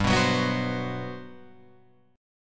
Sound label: G Major 11th, Guitar Open F; strummed; JGuitar